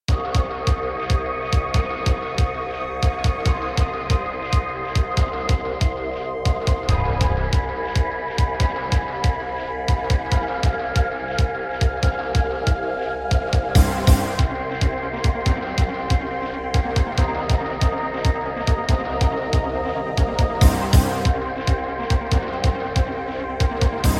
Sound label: Roland Edirol SD-20 GM Contemporary; sigil doom episode 5